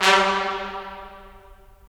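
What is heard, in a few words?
brass trumpets staccato; Roland LA Composer vol. 4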